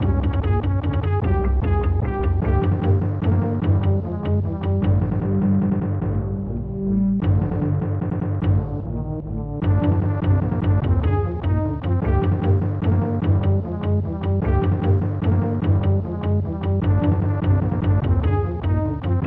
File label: Level Complete; Pitfall The Mayan Adventure; SNES